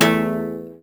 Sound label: Cello Hit String Snap; SFX Kit; Foley - Misc